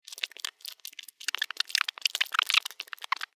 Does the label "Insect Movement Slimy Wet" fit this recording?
Yes